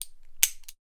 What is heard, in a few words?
gun webley 455 revolver dry fire; sound effects one